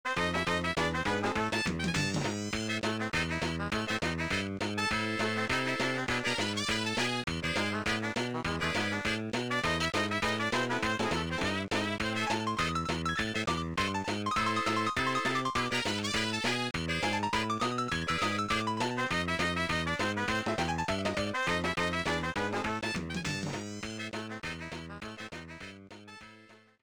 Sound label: Token Tango; DKC2; GBA